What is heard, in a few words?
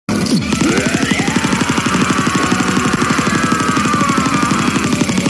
wwrrryyyyaaa; jojos bizarre adventure; giorno